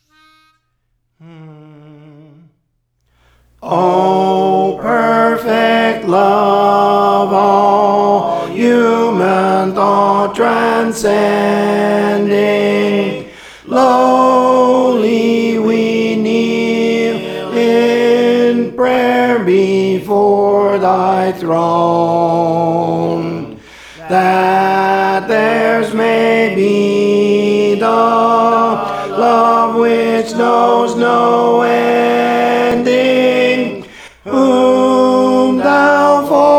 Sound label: Tenor, Songs; Praise For The Lord Training